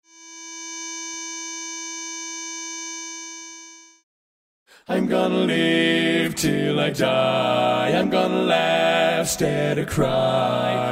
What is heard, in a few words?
Bass